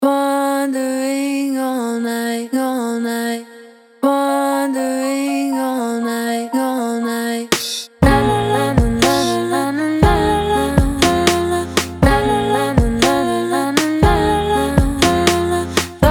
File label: Lost In Silence Vox, mellow beats